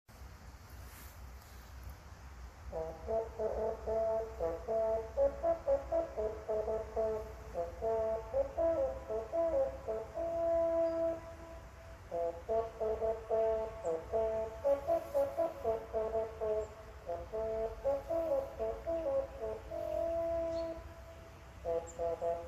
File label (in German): Jagdhorn; Bichler Adventskalender 2020